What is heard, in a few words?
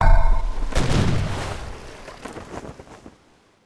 Build Caravel; Civ4 warlords